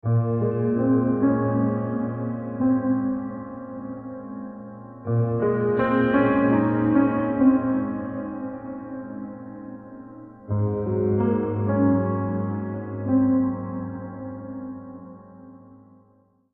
Piano FX; Beds

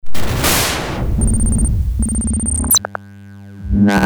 Buzz Me In, Stabs & Bursts; Best Of N2O Library

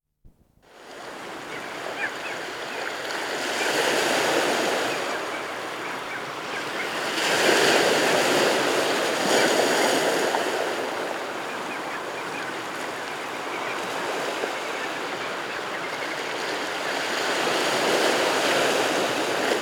Afternoon At the Beach, Nature Sounds; Dr. Sound Effects; 210 Nature Sounds - 20 Hours of Relaxing Natural Ambiences for Meditation and Sleep

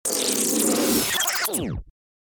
Quick Back, DJ sounds

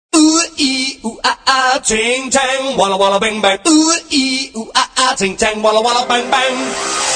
Funny SMS Ringtone

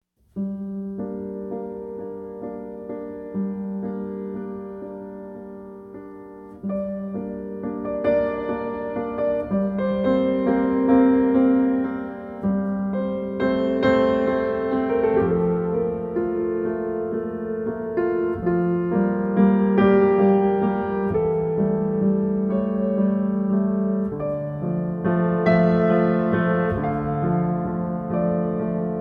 Spring Waltz, piano and cello duet

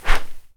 SWISH, FIGHT; 1000fx

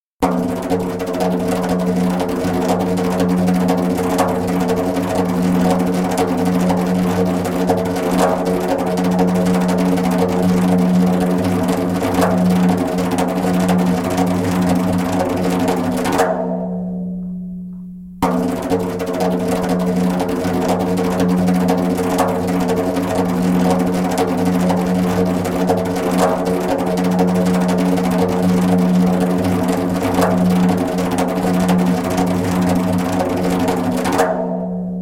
Magical Spins (slow), Djembolale Fills and Drills

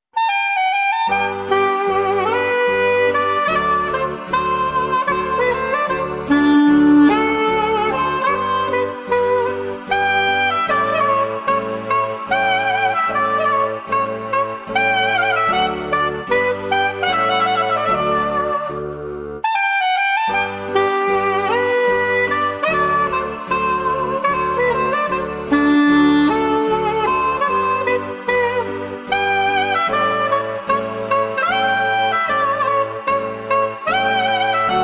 Minuet, Saxophone; Andy Findon; Luigi Bocherini

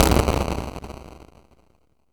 explosion, FM synth; 1000fx

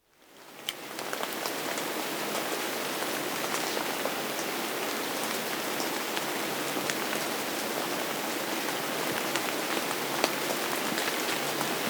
Steady Rainfall; Dr. Sound Effects; 210 Nature Sounds - 20 Hours of Relaxing Natural Ambiences for Meditation and Sleep

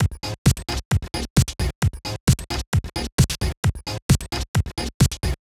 STRIKE DRUM LOOP; Alien Imaging; STRIKE FX

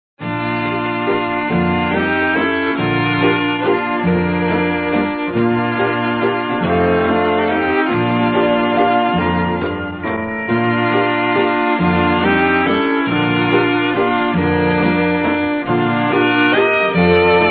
Waltz

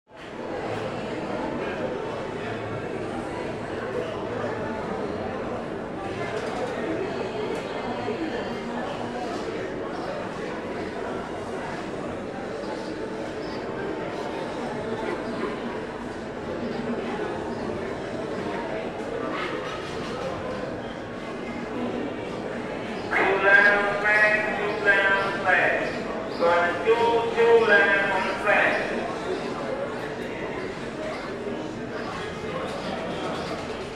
Prison Block Interior Large Male Crowd Voices; Interior Crowds

